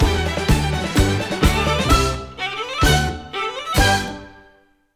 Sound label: Outro; MKWORLD; Moo Moo Meadows